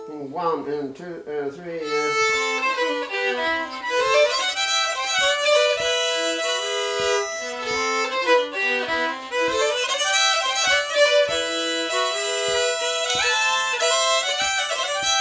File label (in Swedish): Knutslundapolskan